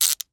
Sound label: Handcuffs Close; Blastwave FX